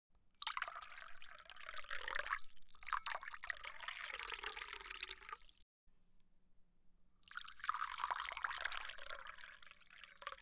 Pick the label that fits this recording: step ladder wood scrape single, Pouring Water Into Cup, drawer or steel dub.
Pouring Water Into Cup